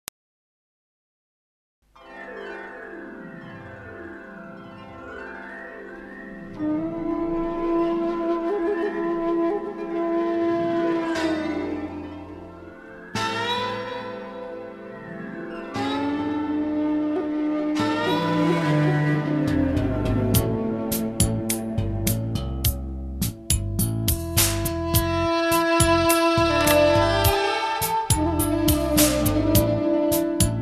Saxophone

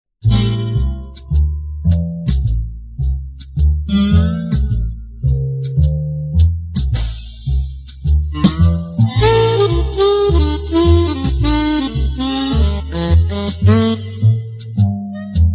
Instrumental

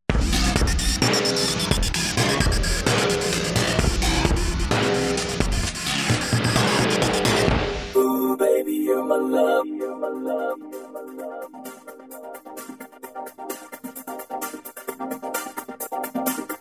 Trance Version

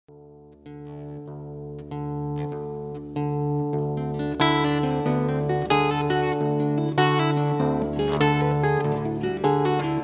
Instrumental

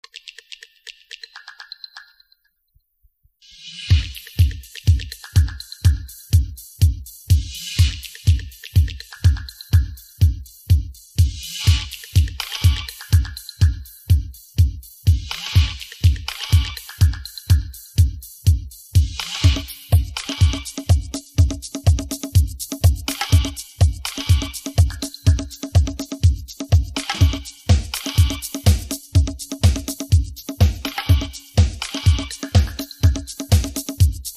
Instrumental

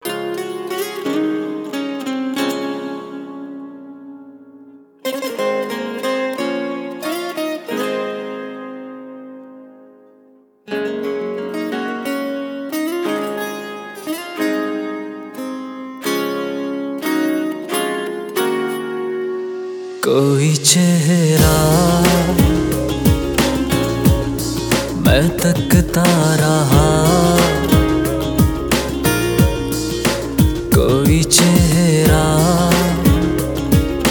Acoustic Mix

